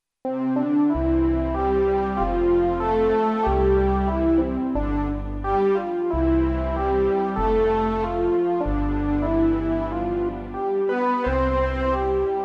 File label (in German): dem herrn der welt sei lob und dank keyboard